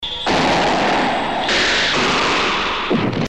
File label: thunder, ambient; colony7